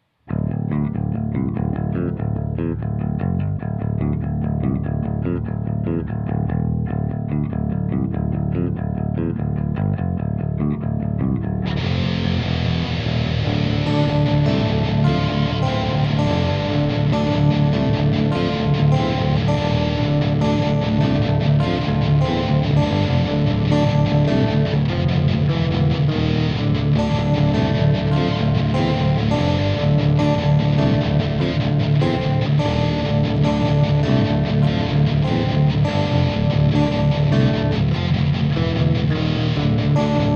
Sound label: riff